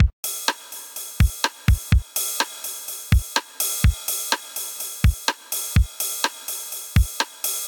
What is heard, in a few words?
707 125 Rim, Drum Machines; Ableton